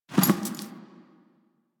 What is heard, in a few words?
Foley Idle Short; Ambience; Teshin; Duviri; Warframe